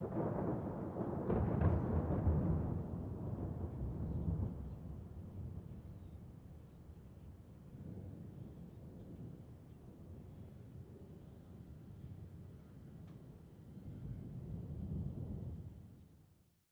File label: ambience thunder; netent